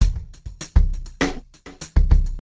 brush muff, drums

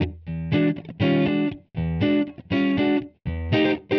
Cool Gtr; LIVE 60s COOL; Loopasonic

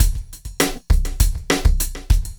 brush muff beat; Loopasonic

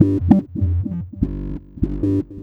fuzz, bass; SWING FUZZ-2; Loopasonic

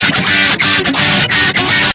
osc, guitars

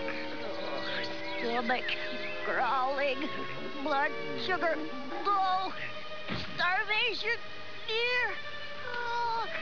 Dramatic

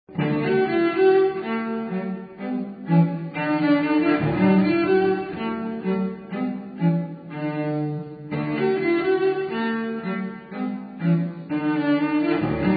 cello gavotte; Gavotte from the 6th suite

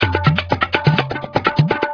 drums

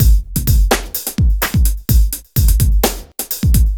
funky breakbeat; Loopasonic

